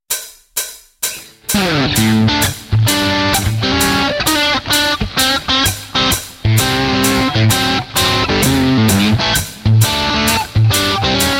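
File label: blues riff, guitar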